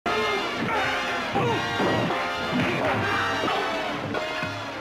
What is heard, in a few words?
wham pow bang